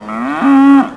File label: success moo